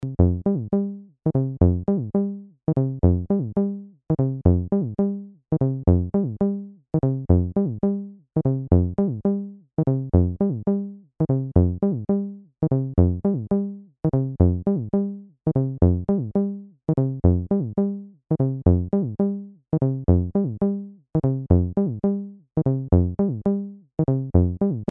TD-3 default

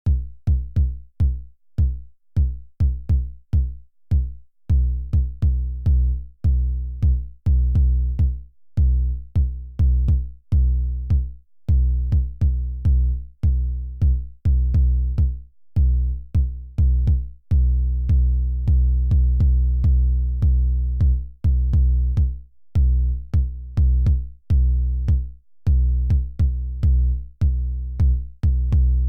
KICK Decay

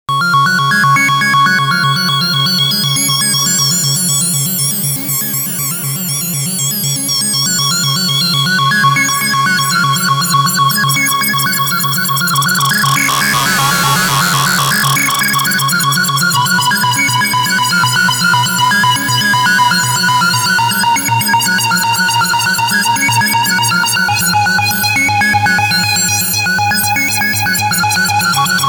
Sequential Pro 3; Gianfar

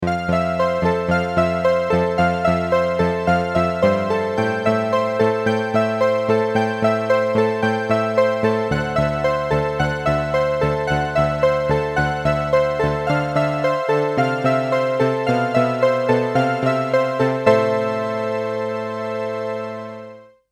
Siel Orchestra Piano Reed

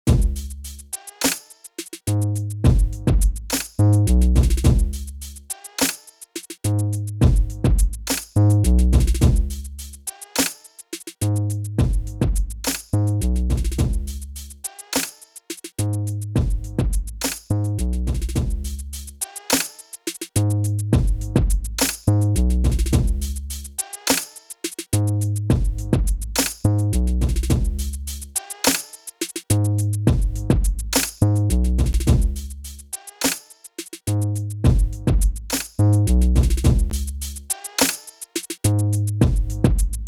harrison comp vca kompressor api 500 loop

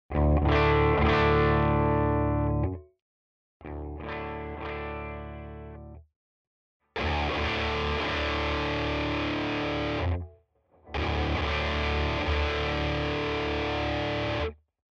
Distortion; LifePedal; Symm

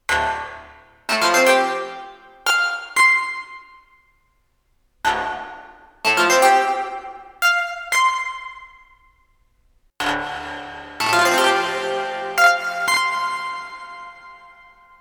modal; logidy epsi; ursa major stargate 323

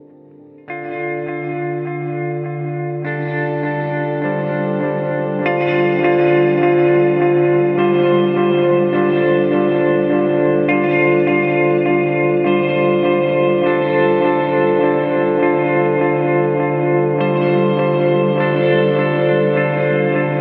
Hall Oscillation; Red Panda Context V2

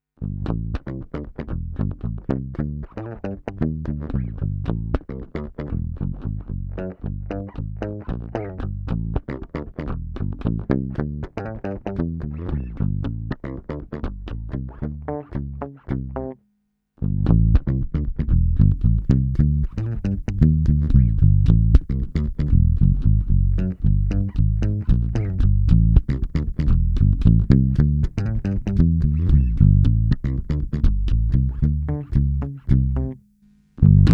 BH-550 L2000 active muted

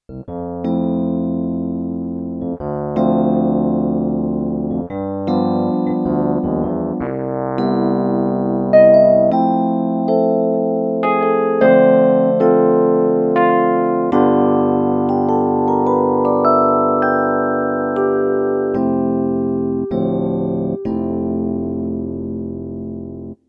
Dyno Stage; Dexibell Vivo SX7

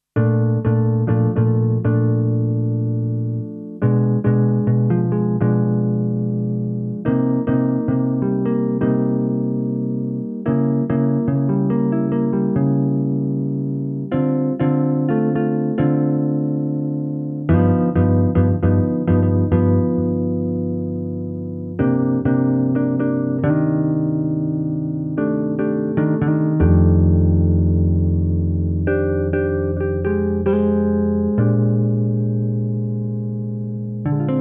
Charlie Lab Digitar

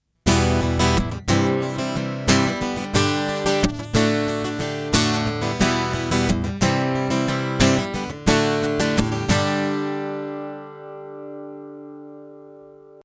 EQ Mode Shape

